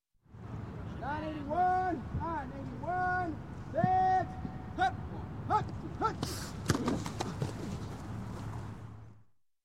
Football Practice; Sound Ideas 2000 Series

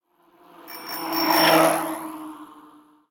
bicycle; Blind Drive

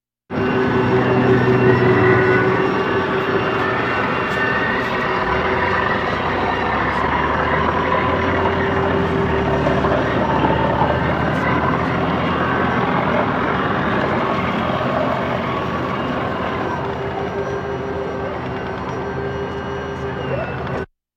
Military vehicles

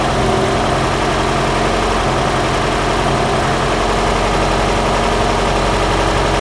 car motor running, street traffic; best service Blue Box

